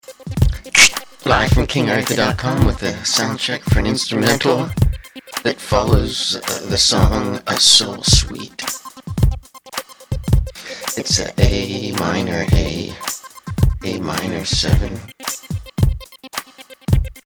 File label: Soundcheck